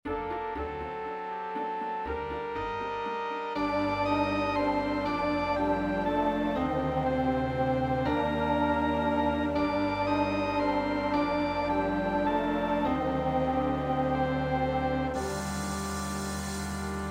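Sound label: tenor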